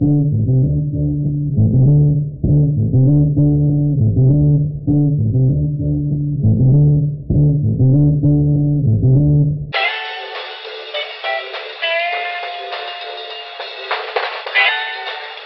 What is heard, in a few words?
guitar wacky, Phonky loops